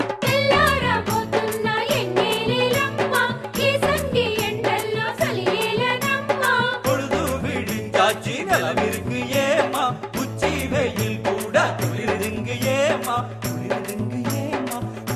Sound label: Tamil Gana